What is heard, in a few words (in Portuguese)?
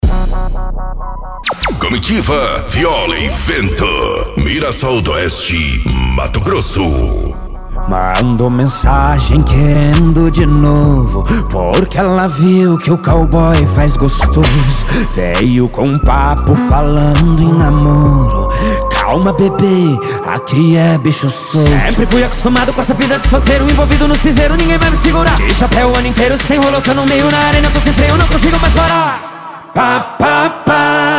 comitiva viola e vento